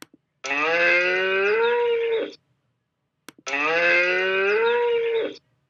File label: moo